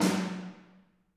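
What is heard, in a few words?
snare drum, Drums; Bob Clearmountain Drums I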